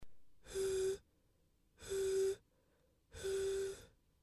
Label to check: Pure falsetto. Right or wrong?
Right